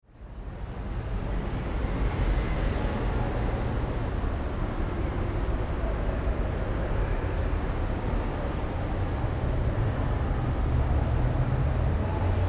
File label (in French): Souterrains Louvres parcours Rivoli parkings